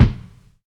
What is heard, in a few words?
thick steel kick drum sample a sharp key